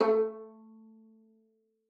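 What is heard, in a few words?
violin pizzicato A5; Xsample VOL-5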